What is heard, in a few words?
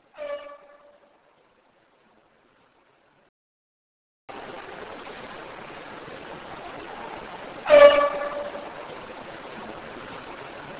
Copper Queen Trumpet; What sounds like a trumpet!; Bisbee/Tombstone